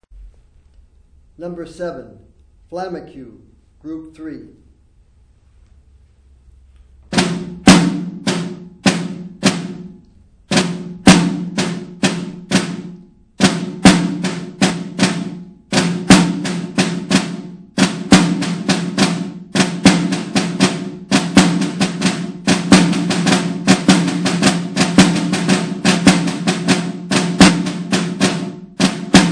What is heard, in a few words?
snare rudiment